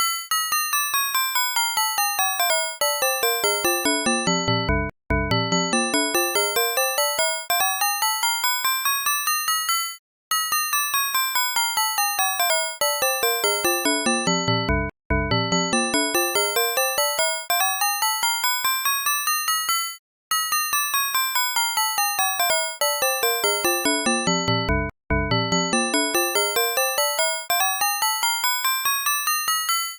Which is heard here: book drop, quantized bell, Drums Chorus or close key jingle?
quantized bell